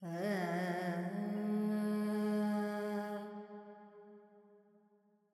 Pentatonic, Vocals Carnatic Female Alaps 70 G

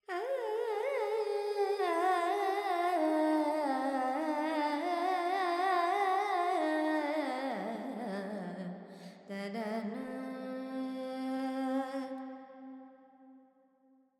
Carnatic Female Alaps, Purvi Kalyani, 100 G, Verb

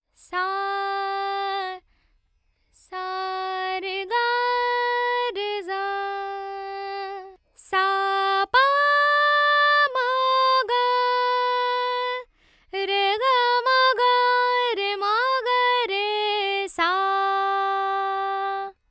Dry 4-4 G 100, Vocals Male

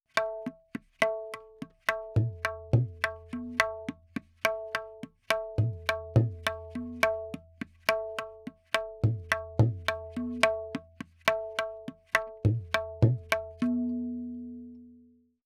Tabla, 4-4, 70; Percussion

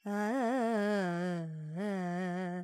Pentatonic, Vocals, Carnatic Female, Alaps, Dry

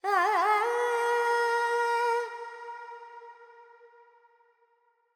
FX Dorian, Vocals, Carnatic Female, Alaps, 70 G

